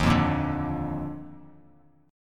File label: D sharp Major 7th Suspended 2nd, Bass Standard; strummed; JGuitar